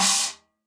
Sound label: CHINA cymbal 14 choke; CHINA PE TW 14 choke; Ableton; Factory Packs; Drum Booth